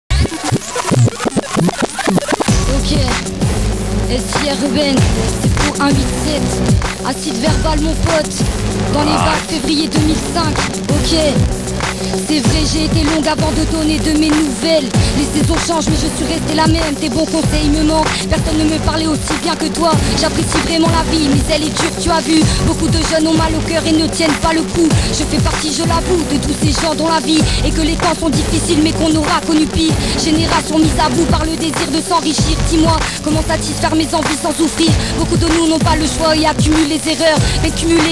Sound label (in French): Siren freestyle radio; radio mutine(2005)